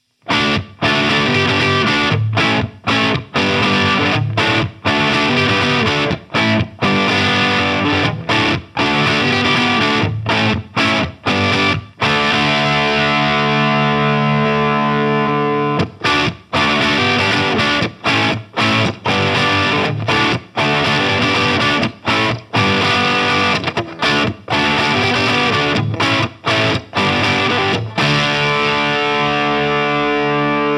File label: Solar Guitars E1.6 Crunch; Vinter Perl White Matte